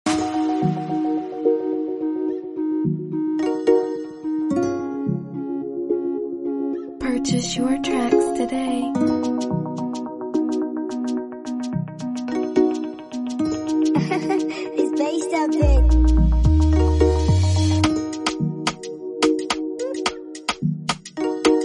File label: Afrobeat Instrumental